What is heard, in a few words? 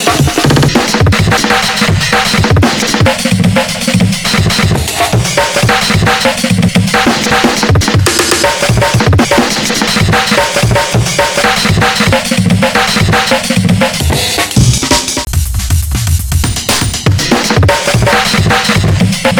PINKIECORE (Drums)